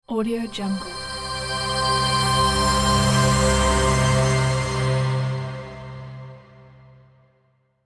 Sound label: serene pulse into accent; sound ideas